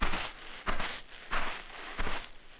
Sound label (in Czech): kroky straz; Mafie 1